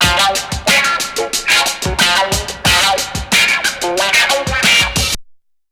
WAHLOOP 91, loop; 0- 99 BPM

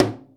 MUTED TOM, drum

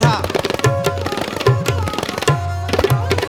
TABLA PARTY; ETHNICSTUFF

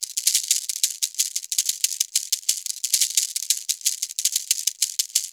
ETHNO PERCUSSION 90; ethno2

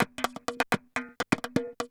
PERCLOOP ETHNO 125

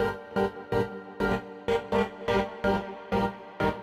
SYNTH INDST 125 A, Industrial, Keys

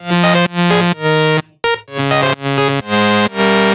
synth 128 Fm; Electro; Anthemic; synth loops

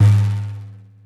NOIZDRUM

